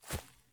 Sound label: run grass low; Escape from Tarkov